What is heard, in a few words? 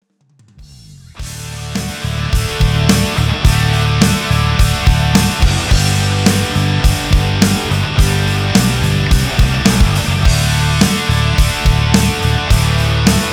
Heartbeat